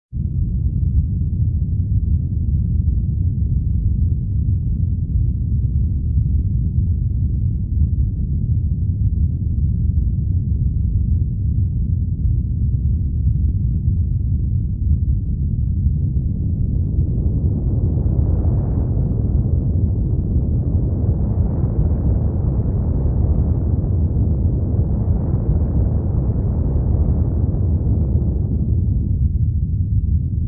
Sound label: Low heavy wind rumble